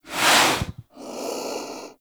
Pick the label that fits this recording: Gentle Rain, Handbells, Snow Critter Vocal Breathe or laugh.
Snow Critter Vocal Breathe